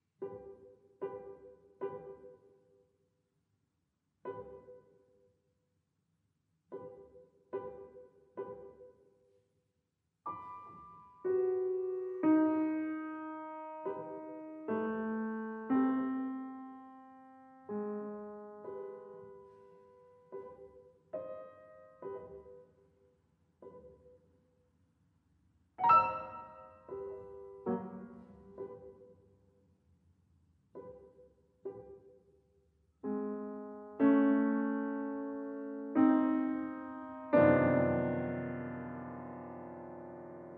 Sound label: Piano Pieces; Six Little Piano Pieces op. 19